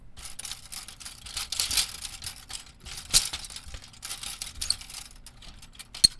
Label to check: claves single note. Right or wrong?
Wrong